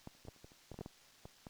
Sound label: fire dead; adarkroom